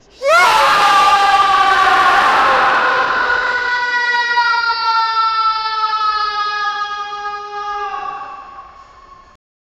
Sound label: it creates an atmosphere, warehouse